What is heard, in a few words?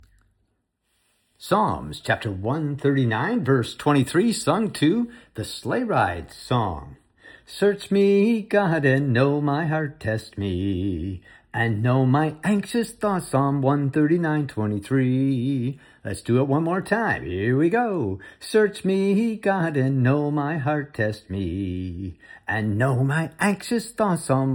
song; Psalms 139 23